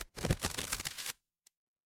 paper fabric crumple

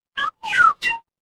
cartoon slide whistle

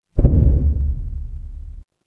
transition braam

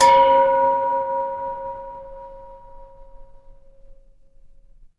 instrument singing bowl

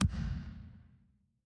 transition impact hit

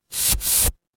paper fabric fabric

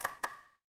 ui click

